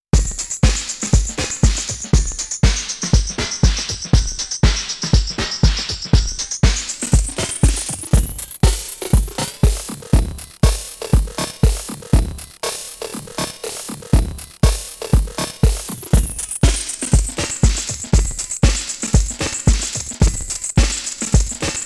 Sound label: Digitakt Vintage Time Stretching; mit Werp